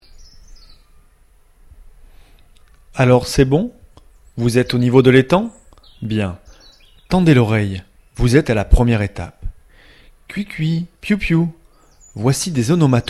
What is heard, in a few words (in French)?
Chants d'oiseaux; Audio guide de la voie verte des Hauts de Tardoire; Printemps; Etape 1